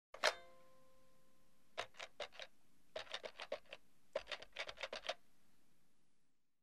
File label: Pick up the phone, dial the number; telephone